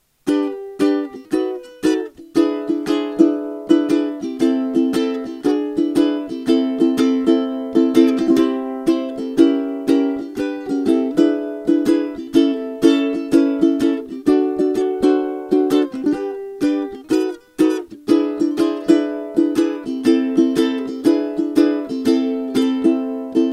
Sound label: SM58 uku